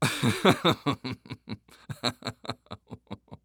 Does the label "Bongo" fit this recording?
No